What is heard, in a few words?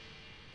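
Crash Cymbals; CMI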